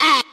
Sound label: ah